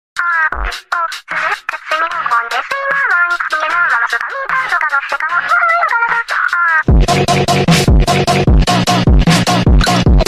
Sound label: x brazilian ultrafunk ringtone